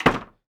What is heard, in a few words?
Perc